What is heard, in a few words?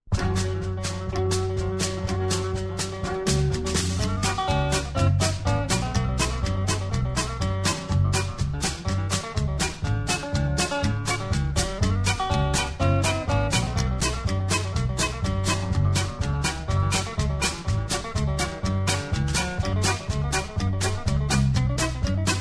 guitar fancy extended, square dance music